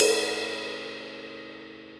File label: CRASH edge tip, cymbal; Ableton; Factory Packs; Drum Booth